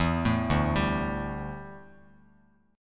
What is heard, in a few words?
D Suspended 2nd Suspended 4th D down Bass Standard; arpeggiated